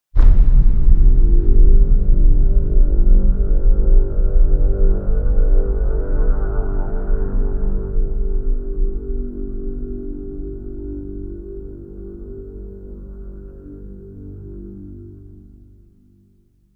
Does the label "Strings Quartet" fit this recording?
No